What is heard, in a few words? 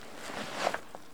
backpack; casualtiesunknown